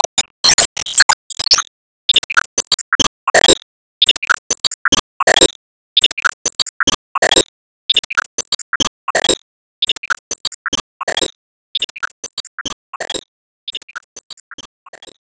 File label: Spin Blade Loop; disney star smash android ios mobile gamerip 2020